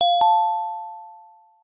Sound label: notify up